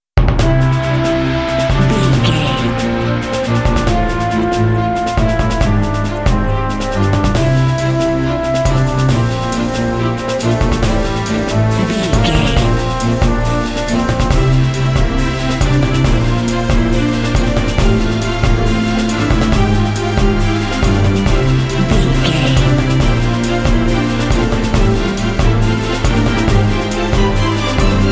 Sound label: orchestral happy proud anthem